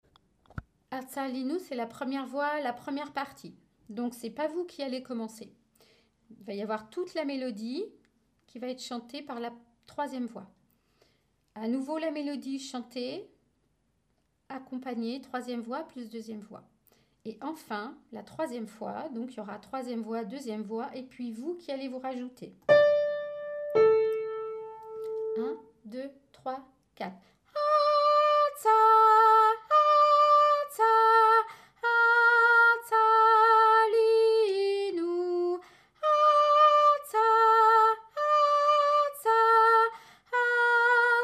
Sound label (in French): 1iere voix; Artza alinou